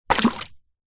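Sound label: leisure frisbee plastic land water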